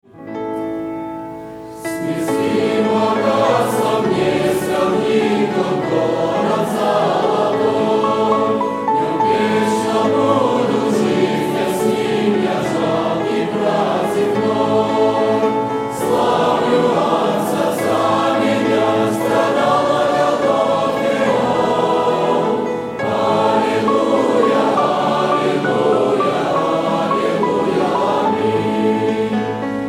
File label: song church